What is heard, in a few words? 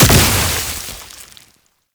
Archgun Proj Explosion; Warframe; Weapons; Archwing; Tn Nokko Archgun